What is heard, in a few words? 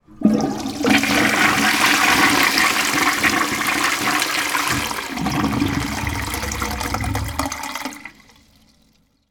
household toilet flush short